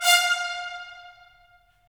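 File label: brass trumpets staccato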